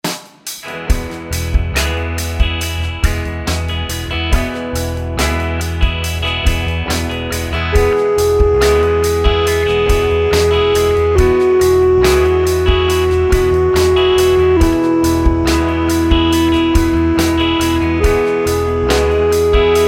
scale degree

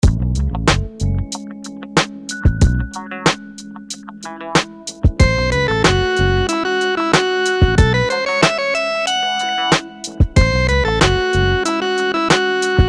rhythms dotted 8s

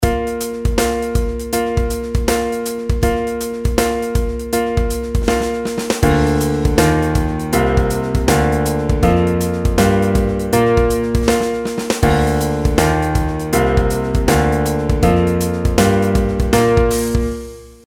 pitch patterns skipwise; melody

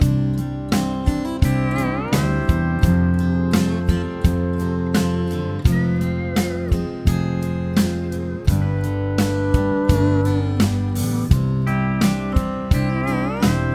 chord prog with mixture

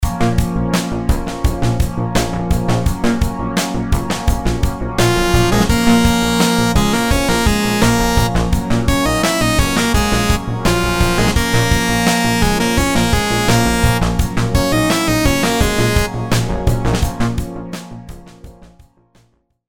rhythms simple dotted 8s 85

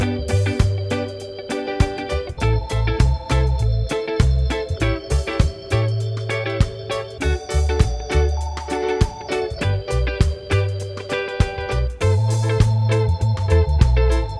progs with applied chords

